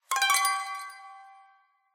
Mystery Pluck; unrest indigo

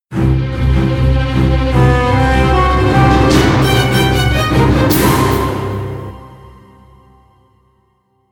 Victory!; Steam Ages

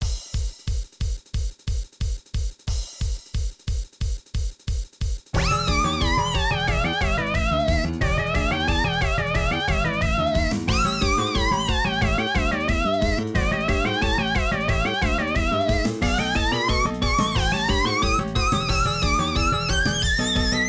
Stage BGM

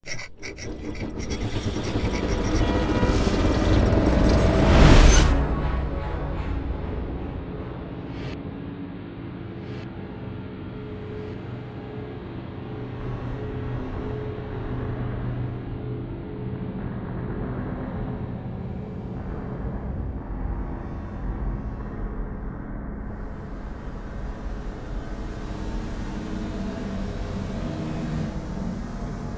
Box Puzzle Solved; Hitchhiker; Ride 5

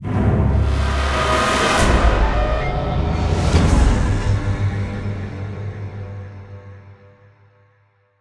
String; Middle-earth Shadow of Mordor

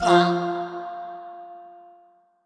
Organ Note; Mario + Rabbids Kingdom Battle

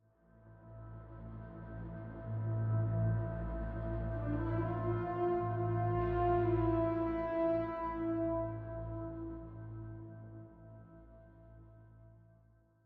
Combat Lite Mood

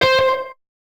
Guitar Note; curse crackers for whom the belle toils switch windows gamerip 2022

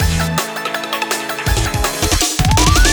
Transition; sky rogue

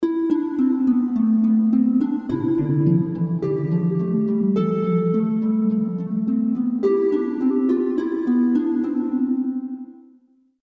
Mermaid's Harp; Tomba! 2: The Evil Swine Return; PS1

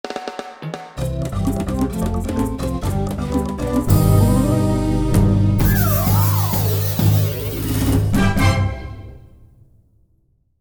Music Jingle Ending Bad

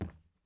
plastic step; teardown; ps5 windows xbox series xs

